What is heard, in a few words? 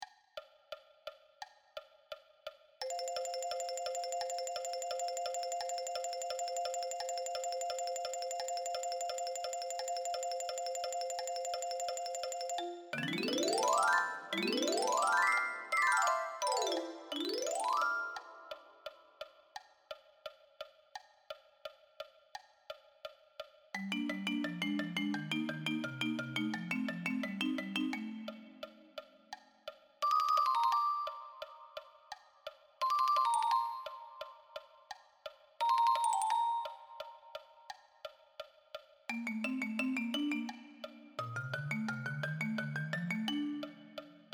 Marimba